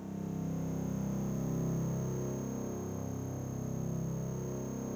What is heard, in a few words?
CARP C1, Yamaha VP-1; Music Store; 90's Sample CDs; THE ABYSS